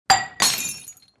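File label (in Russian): бокал упал и раскололся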